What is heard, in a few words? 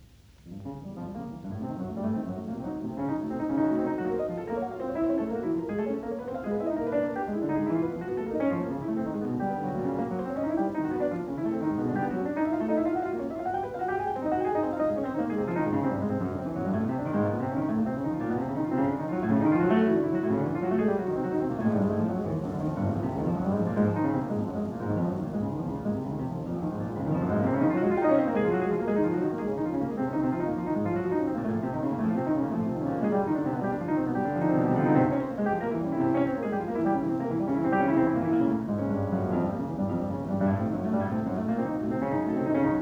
Piano Sonata; Chopin; Piano Sonata No2 Op35